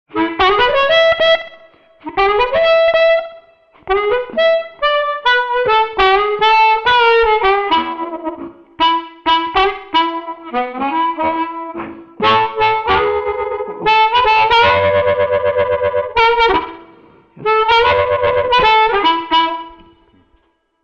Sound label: blues harp